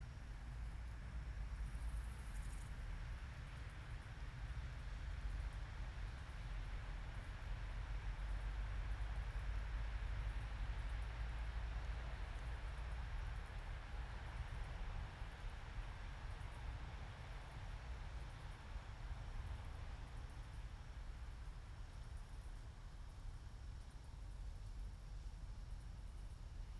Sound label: yamanakako sound